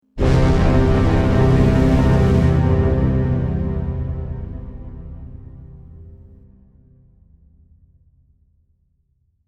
Strings Overture, Orchestral strings overture in C.; Musical